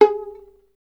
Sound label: STR VLN, Solo Instruments; Miroslav Vitous